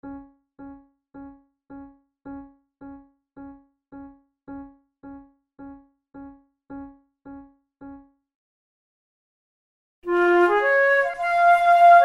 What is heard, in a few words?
methode de flute; tulou